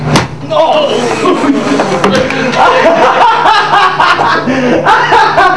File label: die